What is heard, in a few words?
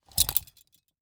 Handcuffs Pulled From Holster; Emergency; AdobeCC